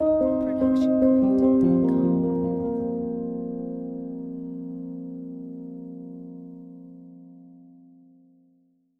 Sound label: Friendly Ident; SoundsCrate